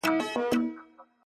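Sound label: budilnik, alarm clock; Dargalon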